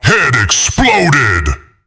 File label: head exploded; cstrike; QuakeSounds